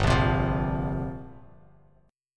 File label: G Minor 11th, Bass Standard; strummed; JGuitar